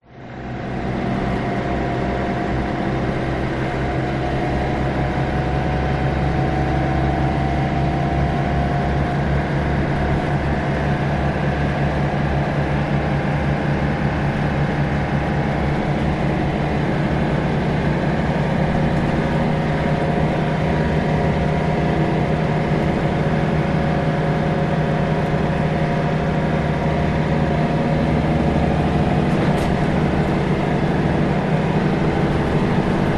vehicles bus ride internal drive highway then stop lights onto residential roads; sydney aus